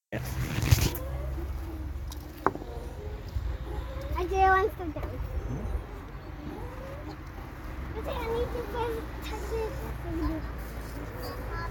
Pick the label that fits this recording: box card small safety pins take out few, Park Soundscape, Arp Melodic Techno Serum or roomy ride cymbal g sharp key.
Park Soundscape